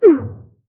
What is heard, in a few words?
cartoony bonk sound; impact